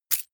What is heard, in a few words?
key ring large catch